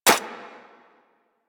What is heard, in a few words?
Pistol Mech Initial, weapons; Warframe; Thanotech